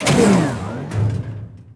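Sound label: door small; Star Wars Obi-Wan; XBOX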